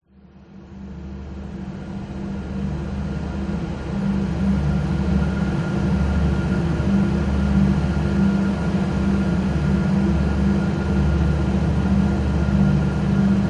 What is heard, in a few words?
horror drone atmosphere processed spin cycle washing machine; scott lawlor